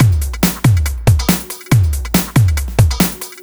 Loops BB 140, BreakBeats; Vengeance Samples

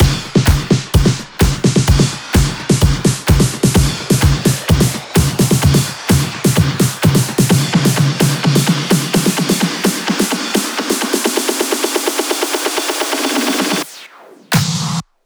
128BPM, House Electro Fills; Motionize; 8Bars; Vengeance Samples

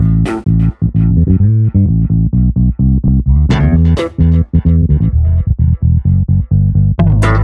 129BPM Hiphouse Melody; VFH1 129BPM Hiphouse Kit

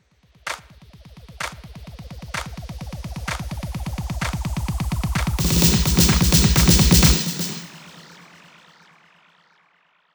128BPM NuStyle, House Electro Fills; Vengeance Samples

